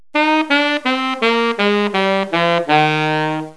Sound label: tonguing, sax; 舌をリードの先に触れさせて音を区切ってみよう; Touguing(タンギング)